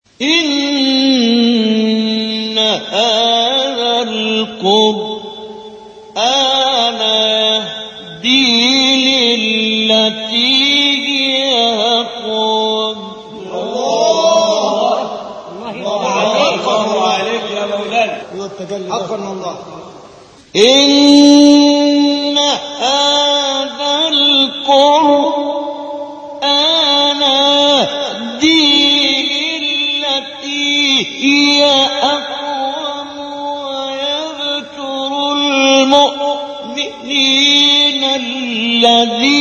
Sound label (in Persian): تلاوت آیه سوره مبارکه اسرا